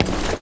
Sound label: player metal